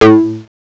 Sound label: SWEEP; CMI Sound Library Rev 1.3